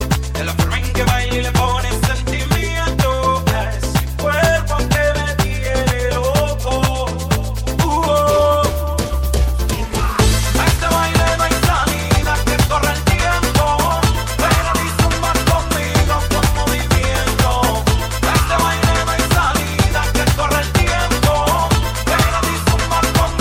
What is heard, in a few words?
zumba; zumba campaign